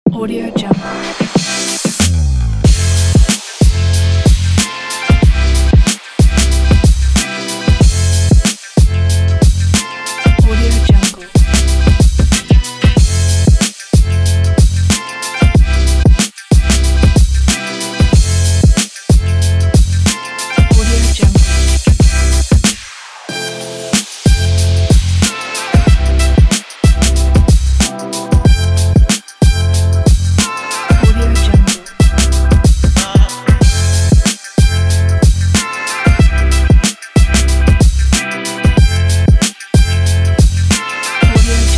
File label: hiphop vlogs